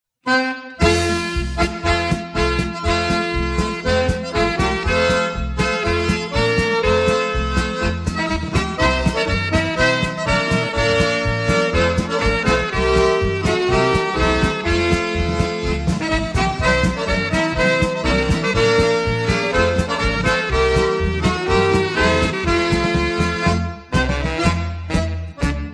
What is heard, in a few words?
Slovenian Polka Medley